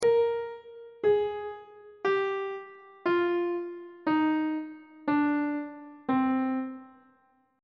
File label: c minor scale descending on treble clef